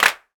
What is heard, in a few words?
Clap